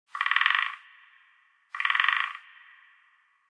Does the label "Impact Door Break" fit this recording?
No